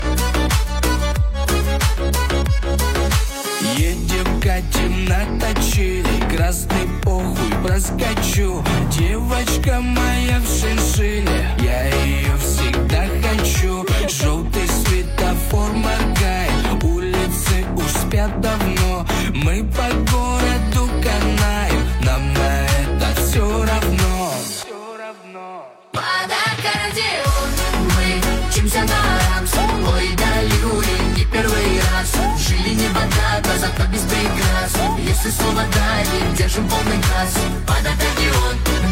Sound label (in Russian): pod akkordeon